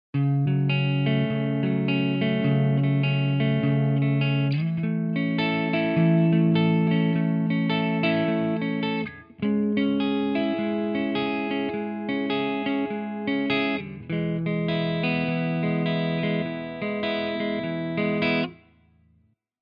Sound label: les paul wet; chiqui audio